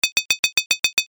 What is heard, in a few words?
stir